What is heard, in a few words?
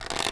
game bow; theodyssey